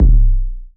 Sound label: 808 kick E; 808s